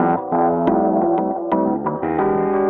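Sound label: KEYS loop L, Drum & Bass 175 BPM; Ueberschall; Drum & Bass Flash Trackz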